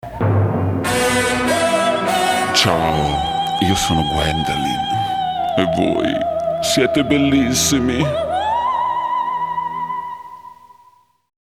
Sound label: Jingle